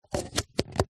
coffee cup disposable plastic lid on; food drink

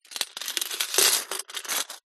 foley money coins in metal money box movement; zapsplat

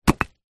brick drop on hard ground; zapsplat

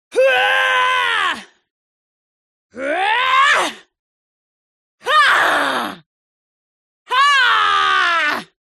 HUMAN VOCAL Female Combat Attack Long; smartsound

